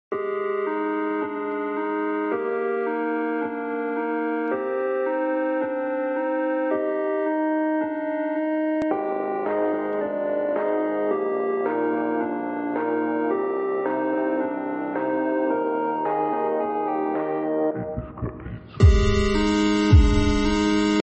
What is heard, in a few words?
sad 8 bit